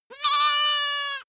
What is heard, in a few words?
goat baa lamb classic